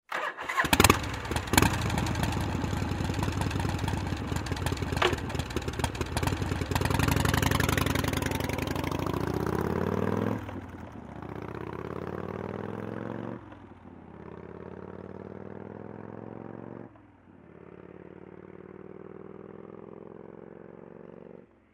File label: Harley-Davidson motorcycle starts, waits, drives away